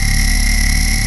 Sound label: Plasma Charging, Plasma Rifle